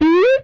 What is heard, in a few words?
Beep; MMRetroArcadeSoundsPack